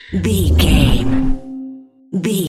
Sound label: fear confuse horror spell